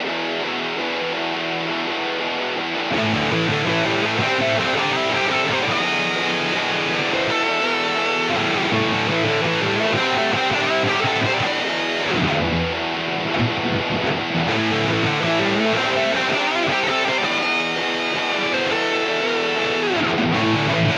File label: 82.75bpm dreamcore